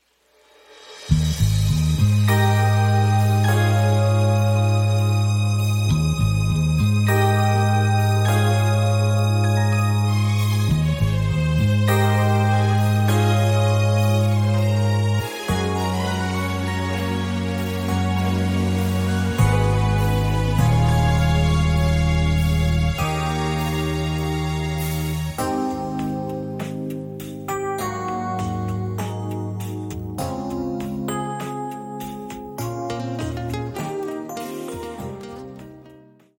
God knows Best Instrumental

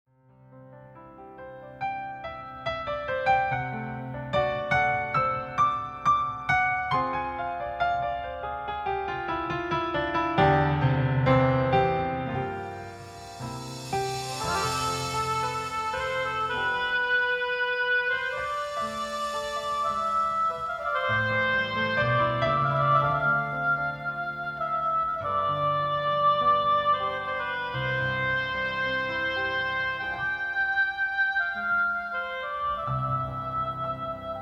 You Understood Instrumental

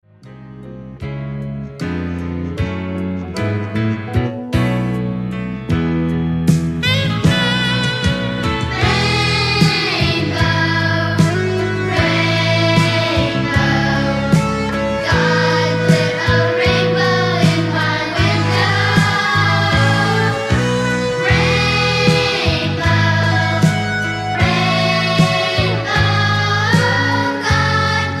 Instrumental

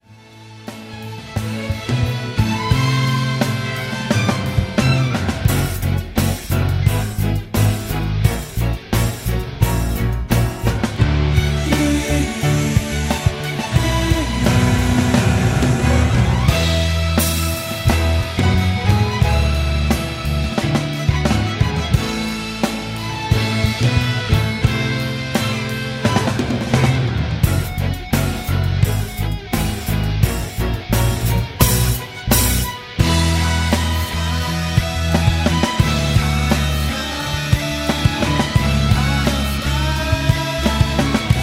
Instrumental with No Lead In

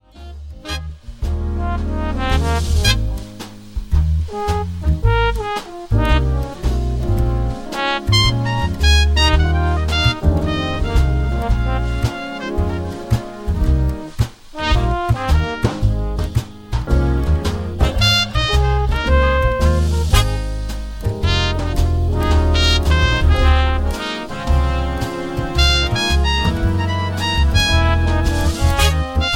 JUST A CLOSER Instrumental

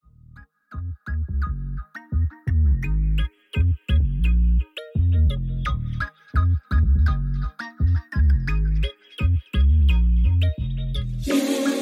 Ten Instrumental w BGVs

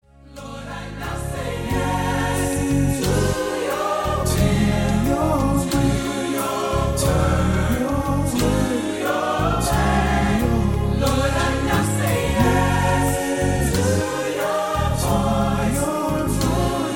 The Appeal Instrumental w BGVs

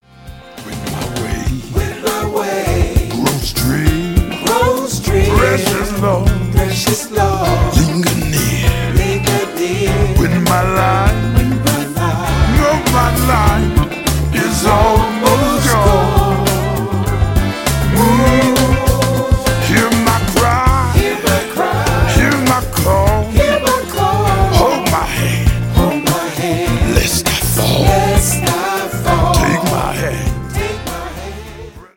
Male Chorus; Fresh Start Baptist Church

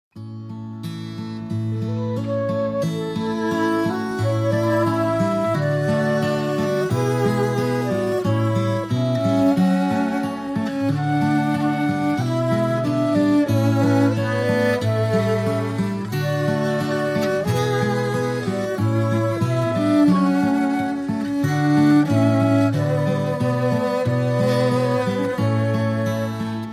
Instrumental No Vocals

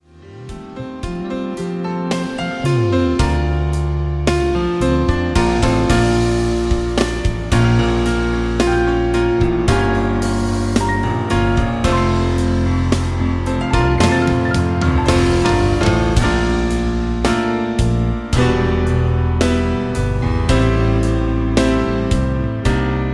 Instrumental

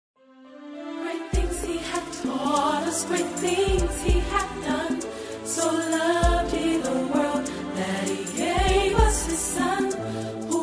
Praise the Lord Instrumental w bgvs

